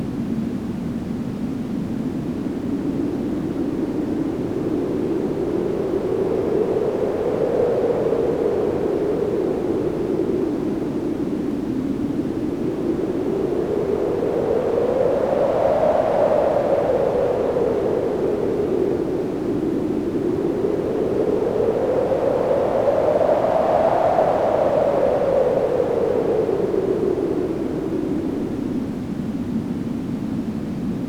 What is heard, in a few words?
wind; NOISE TOASTER